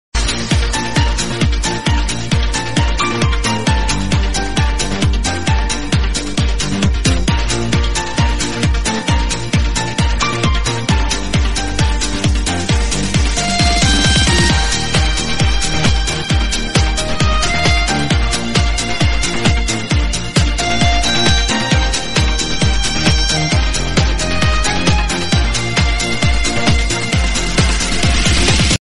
heartbeat instrumental ringtone sad love ringtone heartbroken ringtone